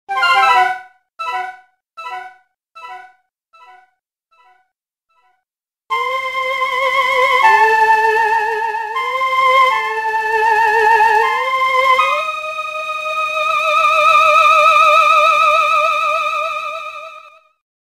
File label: Azure Flute